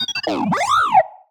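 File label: Sci-Fi Droid Robot Emote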